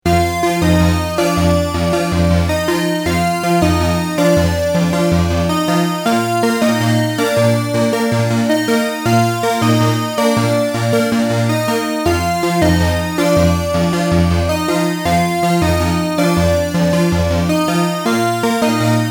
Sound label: 2x2 voices, roland jupiter 4; cv gate modification